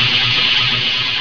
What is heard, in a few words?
DOOR